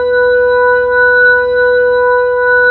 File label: B-3, Keys of the 60s and 70s